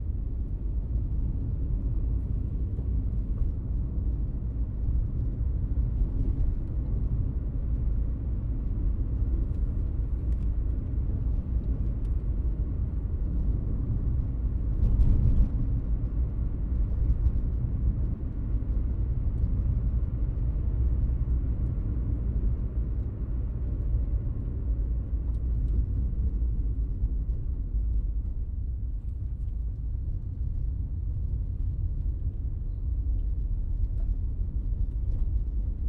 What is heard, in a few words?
Car Inside Ambience